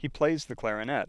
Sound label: plays Clarinet